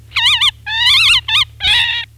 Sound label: woodpecker